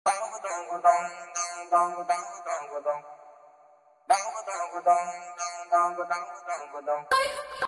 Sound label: Sms